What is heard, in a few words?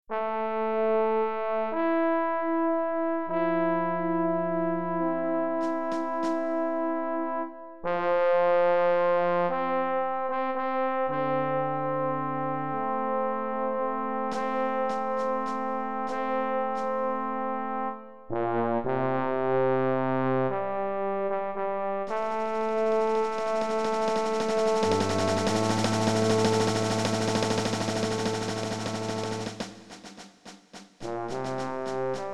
fanfare